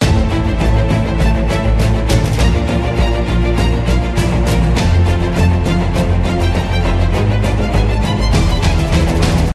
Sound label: Epic; orlamusic